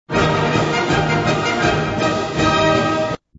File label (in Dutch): klassiek